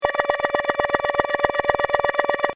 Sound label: Scifi SFX Loop, Short; Stuart Duffield